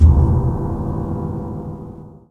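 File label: bullet impact piano; stranglehold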